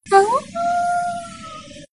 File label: awoo; Voice Awo